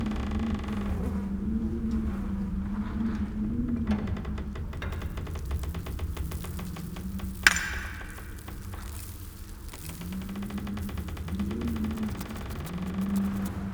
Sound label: Interior Background Amb Loop, Ambience; Warframe; Duviri